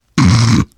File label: laughter, survivor voice